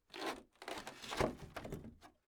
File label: crate of rocks; prosoundfx